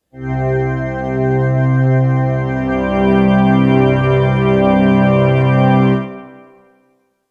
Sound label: organ, acoustic